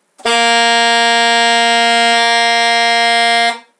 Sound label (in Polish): z oktawowa g1, saksofon